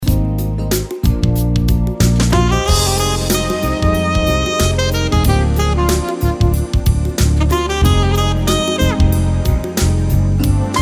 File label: SMOOTH JAZZ